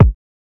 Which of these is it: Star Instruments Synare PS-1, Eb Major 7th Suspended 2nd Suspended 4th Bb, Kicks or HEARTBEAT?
Kicks